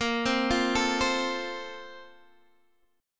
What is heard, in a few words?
Bb Major 7th Suspended 2nd, Guitar, Open D